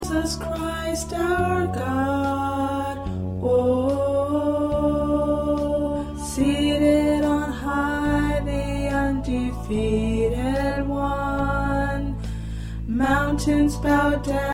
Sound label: Tenor, choir parts